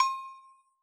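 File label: agogo low, Yamaha DJX Rock Kit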